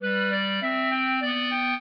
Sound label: trio, clarinet; Mozart